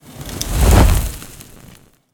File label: mountain dweller texture fire whoosh; Bluezone; Mountain Dweller - Orc Sound Effects